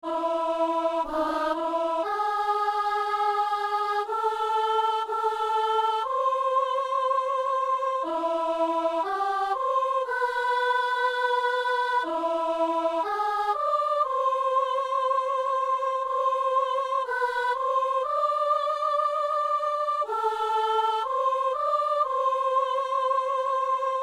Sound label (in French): christ est venu, soprano; (Anamnèse)